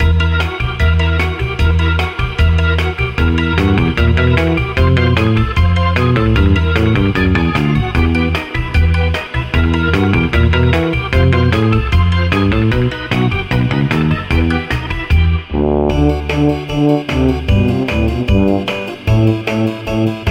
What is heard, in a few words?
GuitarMusic